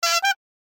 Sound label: pafu